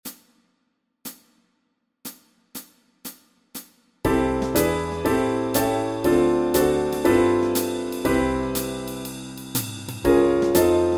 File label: Backing Track, walking bass